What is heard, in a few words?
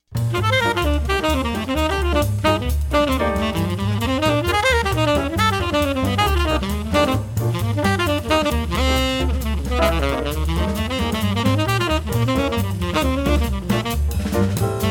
tenor; etudes; state street